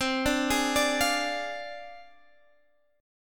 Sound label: Ab 6th Flat 5th C